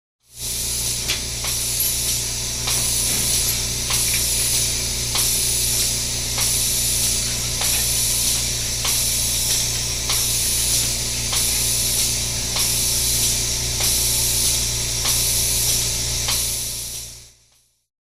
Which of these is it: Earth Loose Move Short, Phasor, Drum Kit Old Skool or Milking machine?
Milking machine